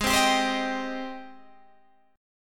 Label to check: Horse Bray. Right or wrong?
Wrong